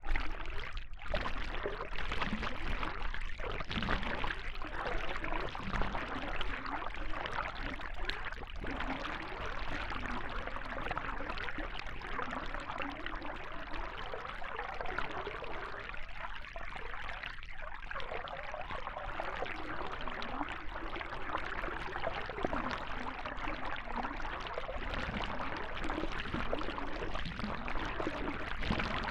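fishing boat trawler onboard various speeds drive varied to steady Hydrophone; Pole Position; Trawler Hundestedt Hotbulb 1977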